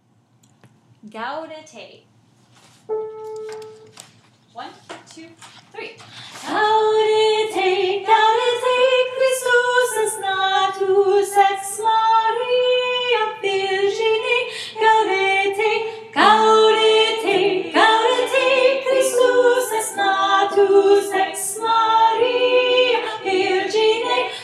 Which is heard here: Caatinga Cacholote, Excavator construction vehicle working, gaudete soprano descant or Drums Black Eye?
gaudete soprano descant